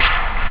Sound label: puff; OctaMED SoundStudio V1